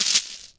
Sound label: Chain Fall Drop; Foley - Rattles